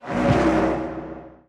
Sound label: Hit Shield; Union